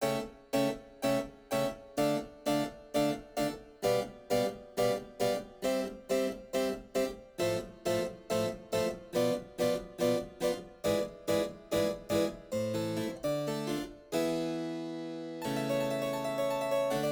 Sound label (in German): Cembalokonzert